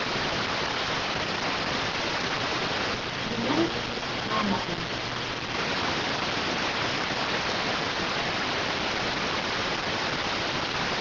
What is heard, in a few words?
rtty rx